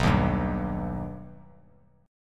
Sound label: B Augmented B down Bass Standard; strummed; JGuitar